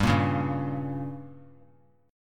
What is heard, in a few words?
G Diminished; strummed; JGuitar